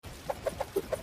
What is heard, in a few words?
pickaxe; fortnite cold snap